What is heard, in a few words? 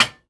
Foley